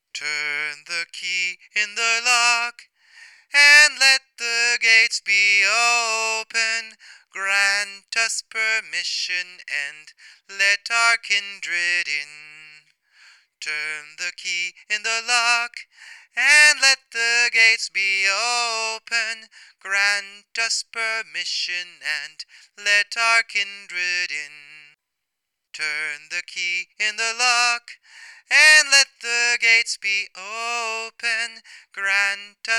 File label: Gatekeeper Chant; pagan